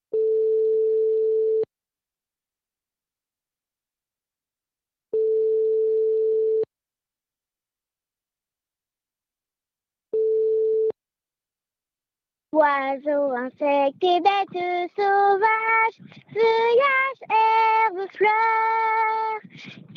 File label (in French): chorale mix